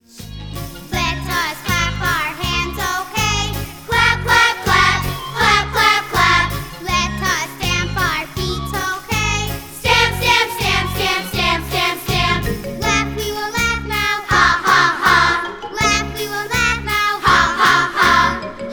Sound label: Let Us Clap Our Hands, Okay; 幼児・小学生教材